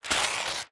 paper tear

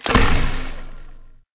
croc saw

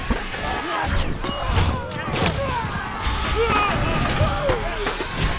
battle present

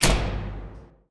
spotlight

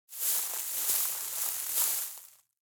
hide haystack

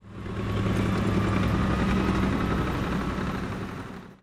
autocannon

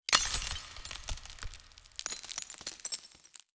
dream broken gradual

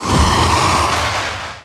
straight fire

